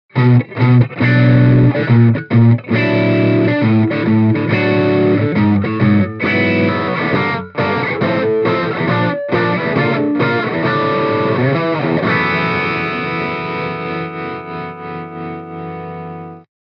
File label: Eminence Legend GB128 Crunch Les Paul